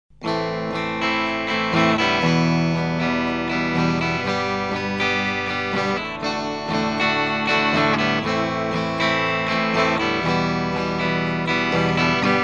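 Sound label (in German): M1000 Akkorde geschrubbt, LTD M1000, Clean Akkorde geschrubbt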